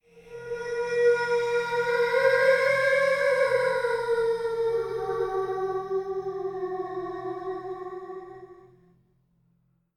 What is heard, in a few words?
Creepy Outro